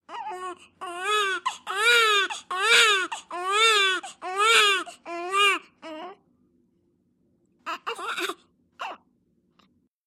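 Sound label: newborn baby crying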